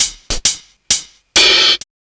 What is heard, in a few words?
Ragga & Dub Reggae; USB Soundscan vol.27; AKAI